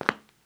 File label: step tile; OneShot Fading Memory